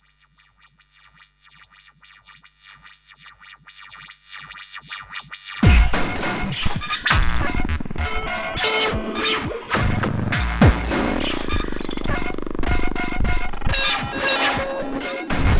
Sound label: Industrial Hardcore Noise